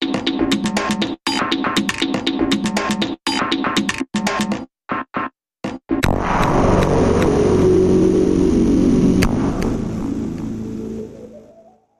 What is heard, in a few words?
Dark Light Sci Fi Bumper Reversed